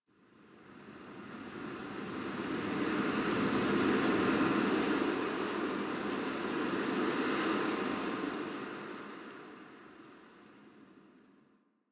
Exterior Winter Wind One Off

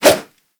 Nunchaku Whoosh; Warframe; Tenno

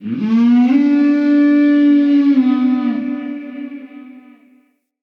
Ext Dist Animal; Midrath Island

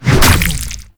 Infested Ancient Tentacle Swing Hit; Warframe

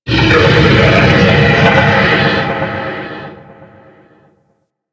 Mountain Beast Call; Warframe; Corpus

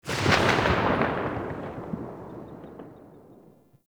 Pistol Tail; Warframe; Scaldra Pistol

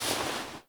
Stand Up Foley; Call Of The Tempestarii; Wraith Quest; Reaper On Bridge; Cinematics

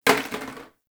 Janitor Plastic Bottle Water Drop; Warframe; Ambience; NineteenNinetyNine

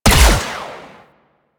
Energy Ball Fire; Mine Osprey

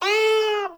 Kitten Meow Agitated; Warframe; Catbrow

